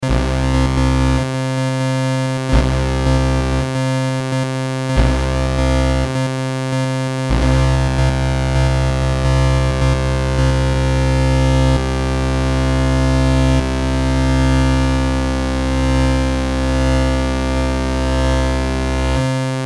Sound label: raw, redsound darkstar